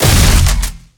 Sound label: Shotgun Fire; Guntech Tormentor